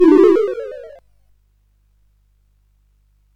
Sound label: SFX; Narco Police